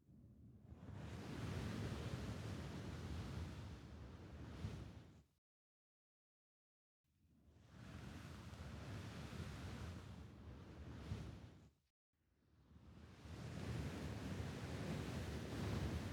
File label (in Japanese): ASMR（尻尾 強）